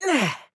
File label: Grunt Attack; Imani